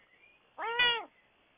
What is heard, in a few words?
cat meow